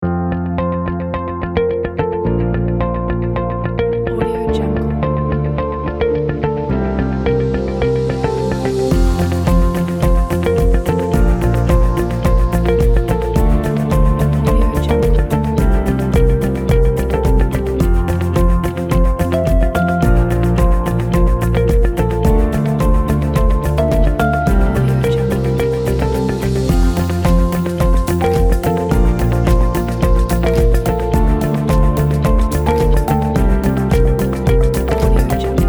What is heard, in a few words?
chill harmonics; neoclassic